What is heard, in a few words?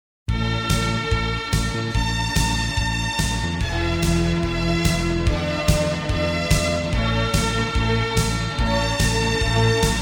Easy Listening & Classical; Sound Ideas